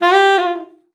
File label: sax g4, Saxophone slides; Session Instruments; Best Service ProSamples vol.42